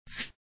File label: Shake; papas pastaria to go; android